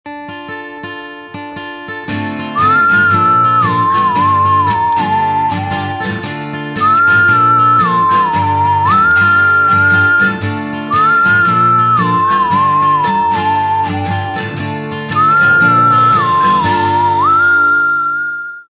TV ad whistle music; SpiceJet Super Sale is back

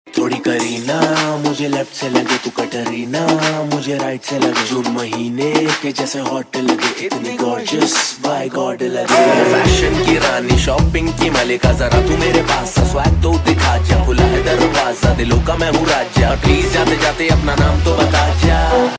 Rap Ringtone, Bollywood; Ranchi Diaries

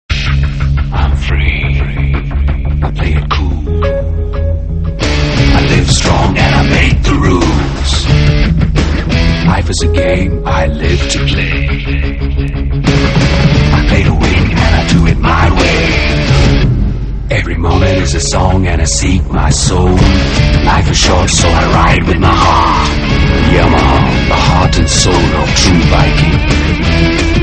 Yamaha Ad, Ringtones TV ADS Tone

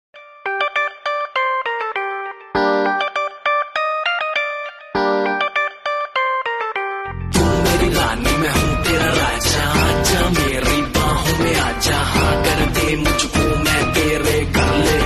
Music Ringtone

